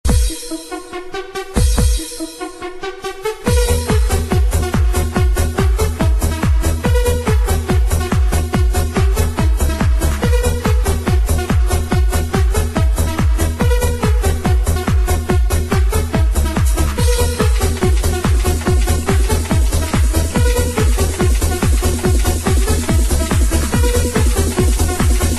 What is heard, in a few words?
Rhythm, Bhangra; Punjabi